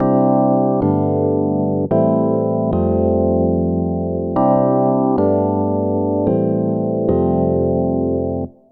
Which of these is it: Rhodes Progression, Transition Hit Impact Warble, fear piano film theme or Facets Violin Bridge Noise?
Rhodes Progression